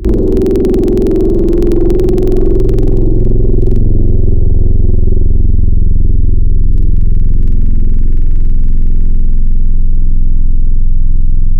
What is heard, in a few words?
Strat Vox Overdrive Mid; Synthetic's Serum Collection; Surge Sounds - The Sky - Serum Wavetables